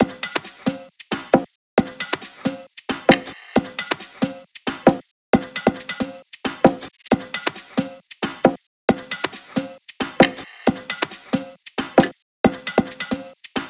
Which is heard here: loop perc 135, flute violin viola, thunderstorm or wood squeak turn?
loop perc 135